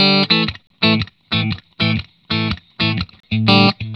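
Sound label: rhythm 120, Vintage Blues Guitar; RYTHM 120; USB Soundscan vol.22; AKAI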